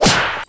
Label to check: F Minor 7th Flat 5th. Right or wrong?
Wrong